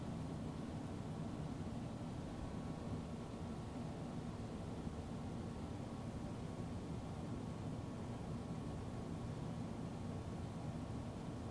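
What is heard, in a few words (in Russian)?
близкий внятный бесконечный шум работы производства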